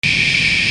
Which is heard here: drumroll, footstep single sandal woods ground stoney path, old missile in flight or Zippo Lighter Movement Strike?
old missile in flight